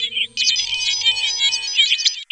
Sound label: SCI-FI FX; Best Service; XXL Production Effects; Sample CDs